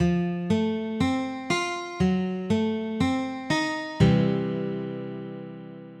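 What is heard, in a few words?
f f#dim7 c; f+fsdim7+c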